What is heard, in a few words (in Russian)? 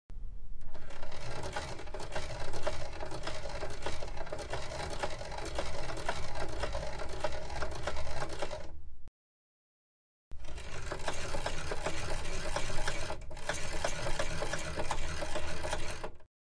vraschenie rukojatki